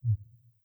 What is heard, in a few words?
Miniglobe Shrink; Google Earth VR